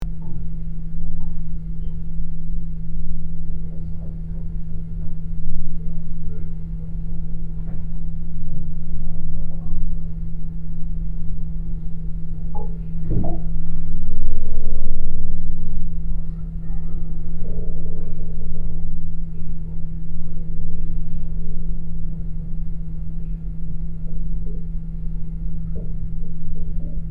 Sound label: Ship ambience sailing moving at sea interior perspective; boat